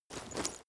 leather metal walk fast single

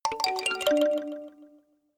notification kalimba delay sequence major

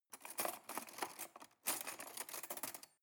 cutlery cake fork drawer handle long

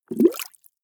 bubbles medium

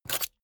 key ring large grab table hard

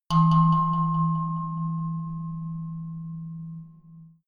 melodic odd tine bell low

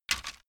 bamboo pick up

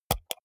computer mouse wheel click hard

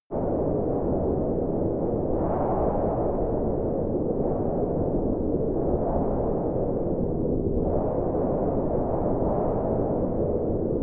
wind cold interior

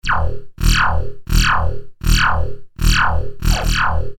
finished lazer

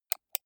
computer mouse right click

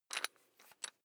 electric drill bit fasten wrench turn very fast

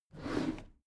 packed drawer smooth open regular halfway

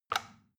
plastic button tiny release regular

